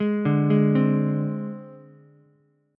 C Suspended 2nd Sharp 5th, C, Ukulele; arpeggiated; JGuitar

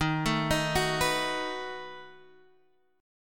B 7th Eb

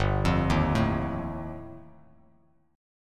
G 7th Suspended 2nd Sharp 5th G, Bass Standard; G7sus2#5 arpeggiated

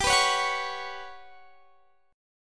G Major 7th Flat 5th G, Guitar Standard; GM7b5 strummed; x,22,21,18,19,21

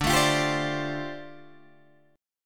D Minor 11th D down Guitar Open D

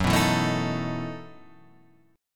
E Major 7th Suspended 2nd Suspended 4th Gb

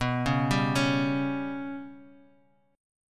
B Suspended 2nd Sharp 5th, Guitar, Half Step Down; Bsus2#5

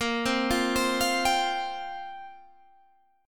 C 7th Suspended 4th

